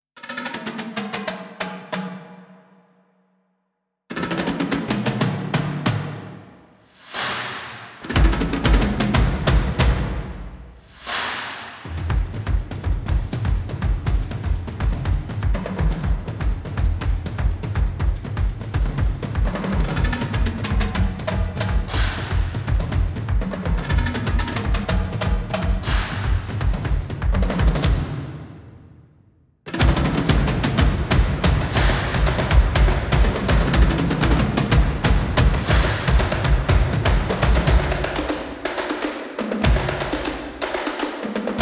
flutter tom, epic ensembles tom; epic ensembles tom factory by fracture sounds

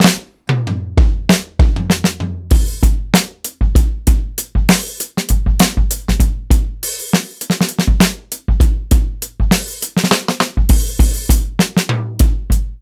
fat hayman kit, drums; sun drums by soniccouture

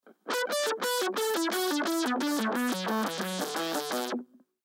palm mute, fuzz; lee cosmic level fuzz; marco dodin

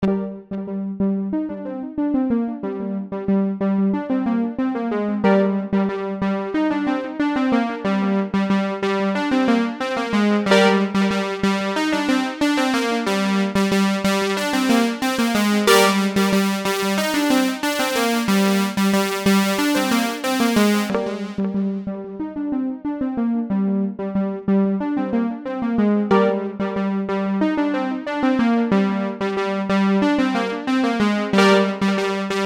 dirty stab; bluewave by music sound software

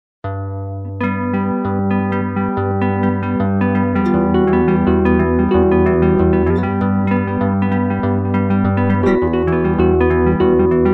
guit neck

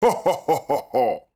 maniacal laugh; foley human; nova sound

